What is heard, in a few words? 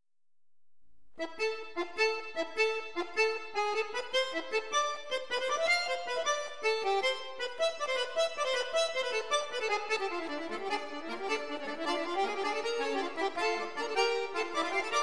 flute